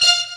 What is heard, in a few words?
Disco Madness; Digital Sound Factory